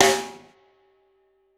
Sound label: Kit, breakcore Drum Hits